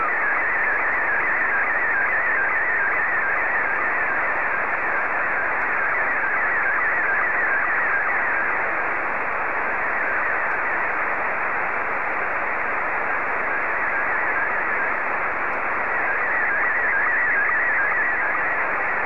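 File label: SSTV, Scottie 2; VK4MSL SSTV RX @ QG62ln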